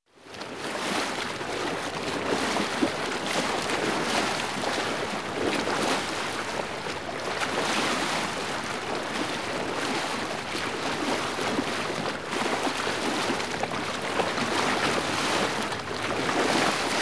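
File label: yacht moving, water noise; Моторная лодка, катер и яхта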